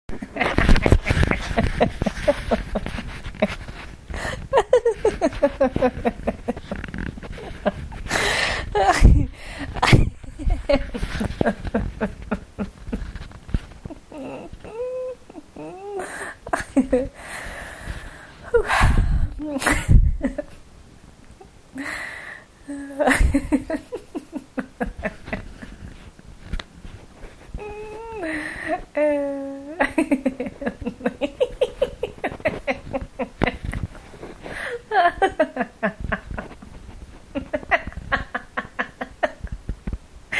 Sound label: laugh